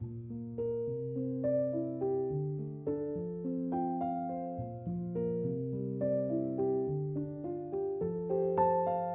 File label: Fmaj 105BPM Piano; Monosounds; Smoking Hot; PANDORA BOX!